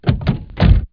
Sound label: car door